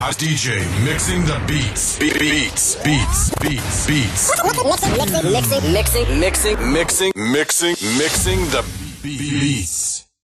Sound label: DJ Sweep, Radio style ads intros sweeps & jingles